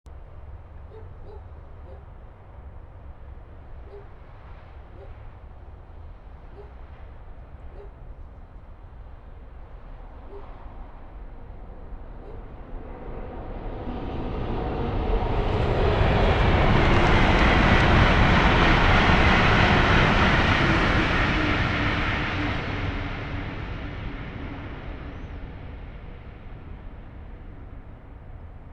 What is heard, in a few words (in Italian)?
Treno; Eigenmike; ComunePR; NuovaStradaF